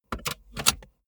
car bmw e46 interior safety belt insert

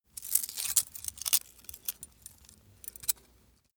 small metal handling short; industrie wirtschaft; metall

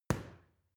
boxing paw hit soft; boxen

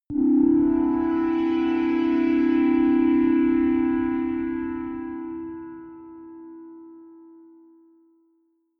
sunrise

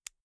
insert xlr extension latch slow; objekte; elektronik

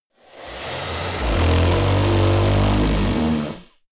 monster snore breath low; koerpergeraeusche; comedy-cartoon; soundpool

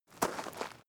step gravel single; schritte; menschen

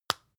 floss open hard; haus-garten; badezimmer

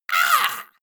monster hit high; schreie

